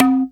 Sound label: Minimal Perc; Minimal Percussion